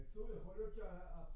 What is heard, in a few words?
C Bass Bot